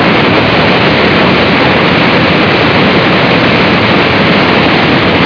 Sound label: water waterfall